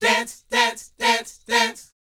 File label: LET, Vocal, R&B Dance, DANCE 120